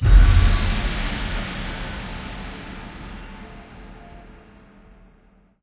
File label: generic Hit Small; parthenon